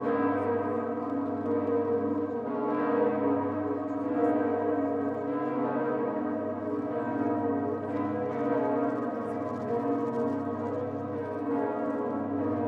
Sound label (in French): glas; strasbourg